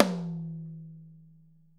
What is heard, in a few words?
ROTOTOMS; Sonic Reality - Vintage Timetraveler